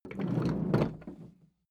roll smooth

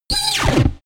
jammed laser beam; krieg-waffen; soundpool

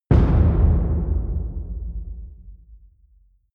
wood hit large table

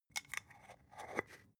spice jar scratch tonal close soft; industrie wirtschaft; gastronomie

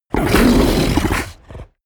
fishman snort aggressive; kreaturen

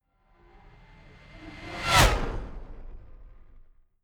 fly by hover car hum high

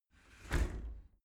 shower slide door close hit soft

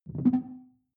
sci-fi alien bounce; swooshes-swishes

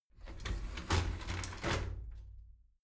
shower slide door inside open soft

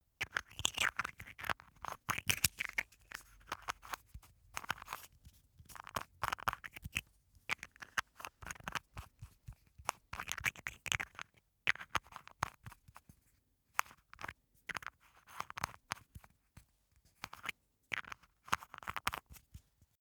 makeup box handling; haus-garten; badezimmer

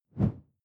whoosh simple slash big low

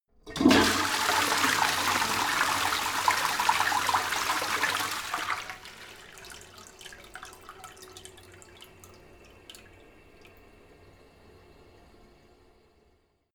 toilet flush long, badezimmer

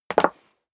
step high heels wood parquet jump; schritte

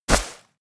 step walk grass; schritte

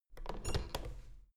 door plastic handle pull up creak soft

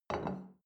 plate place table soft; soundpool

